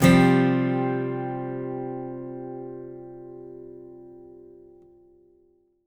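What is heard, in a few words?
ACGTCSUSD -R, Country, acoustic guitar sus; Whole Lotta Country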